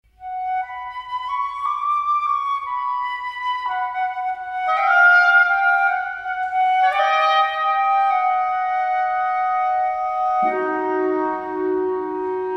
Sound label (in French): boîte il pleut bergère mineur majeur